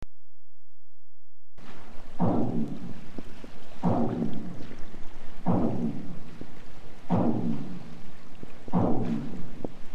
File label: Piling @ 30km; offshore wind farm construction; marine mammals